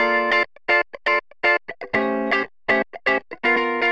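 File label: wet guitar funk, Multiband neural audio effect; Demo without amplifier; Wet-NoAmp; prism distortion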